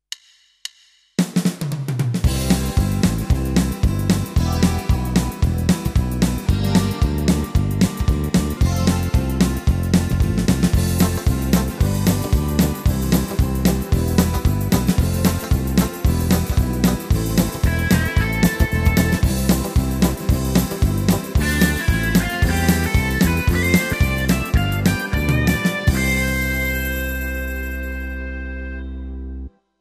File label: Modern Folk Fast Beat; styles tyros2